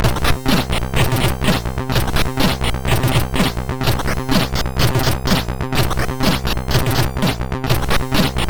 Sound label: sid MOS 6581